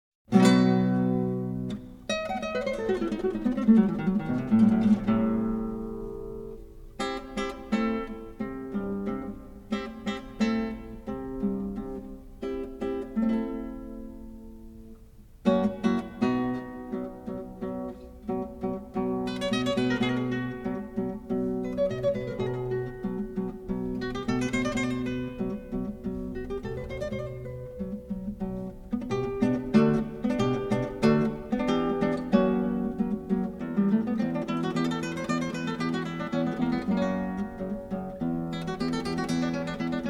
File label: Danza Mora, Spanish Guitar; FRANCISCO TARREGA; The Spanish Guitar